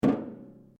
RUBBER ON METAL HOLLOW; Metal (Sound Effects:Materials)

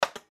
Switch Button Metal, Switch Button Metal Push; Switches; Foley

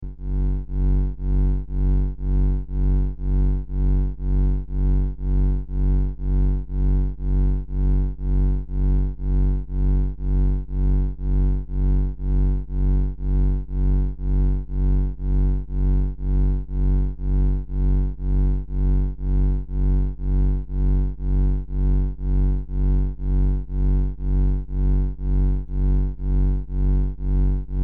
Science Fiction Electrical Hum Drone; Drones; Sound Design

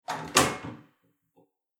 Toilet Shut Bathroom Door; Door Closing Sound Effects; Household Sound Effect

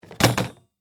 Animal, Cage, Set, Down; Insects; Extermination; Animals:Insects; BlastWave

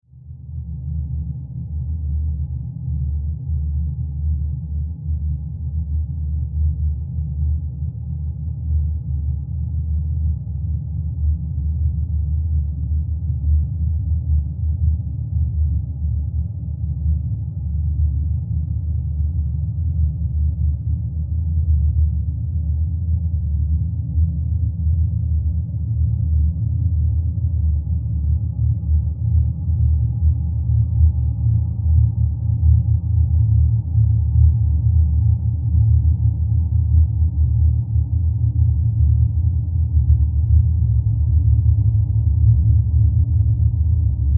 Horror Drone Low Growl Moan; Evil Sound Effects; Evil (Sound Effects:Horror); SFX Bible 6

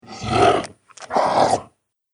Troll Eating, monster; horror

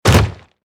Sports Football Tackle Impact

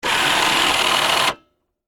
Winch Lower Motor, Industry, Machinery; BlastWave

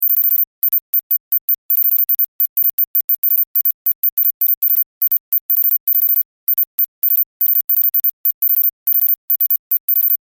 Science Fiction Teletype Readout Beeps High Pitch; Communications

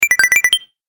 Health Booster, video game

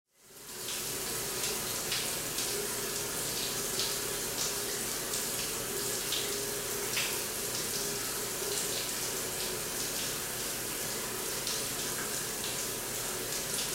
Locker Room Shower, Locker Room Shower Run; Miscellaneous Sports; Miscellaneous Sport Sound Effects; Blastwave

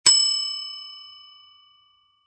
Bell Front Desk Bell Ding; Lynne Music

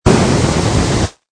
Flame Thrower Blast, Flamethrower single blast.; Explosions; Lynne Music

